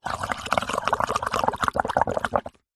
drink bubbles blow glass water surface through straw; food